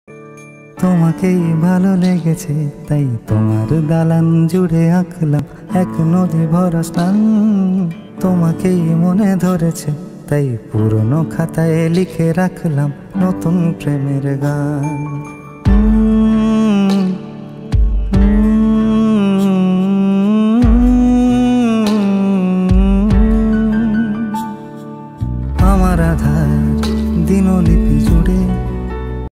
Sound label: bengali ringtone